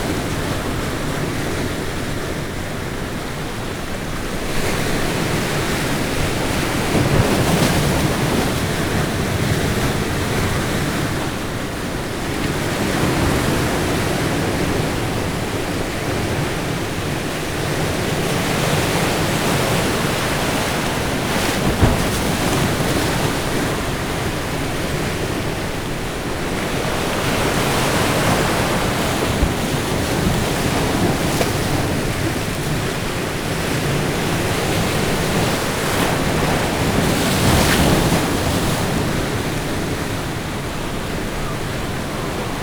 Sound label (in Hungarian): egyenletes, ocean; orzola